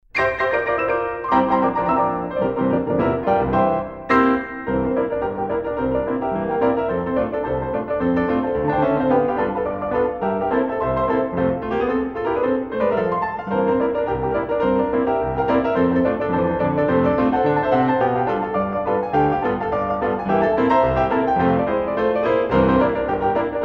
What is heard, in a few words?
Piano Roll